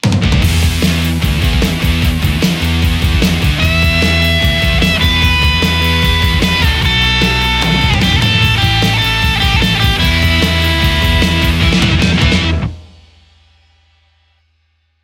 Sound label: les paul; kuassa Vermilion; toobig; ampsims